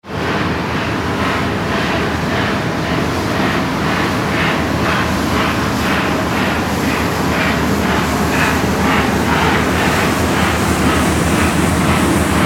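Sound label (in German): Hamburg Landungsbrücken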